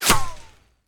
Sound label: Bullet Impact; Sound Ideas; Guns